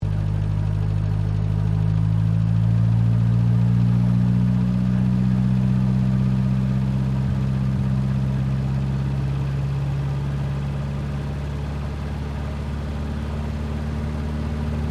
Tank Centurion Secondary Engine Accel, British Centurian main battle tank; Trucks; Vehicles